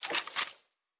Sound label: Weapon Reload; Sci-Fi Sounds